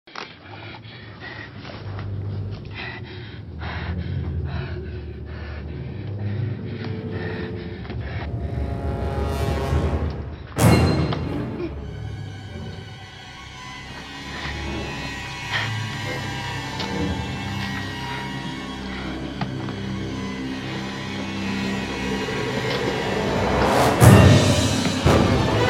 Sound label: SOLO ALIEN